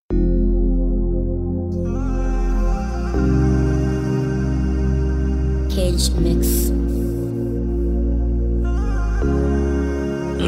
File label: Instrumental hook